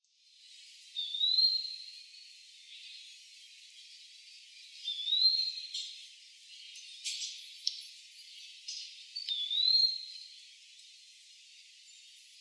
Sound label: Contopus virens